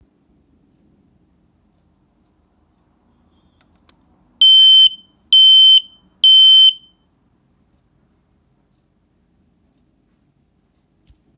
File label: firex smoke alarm padc240 test sound